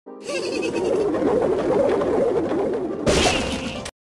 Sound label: Goblin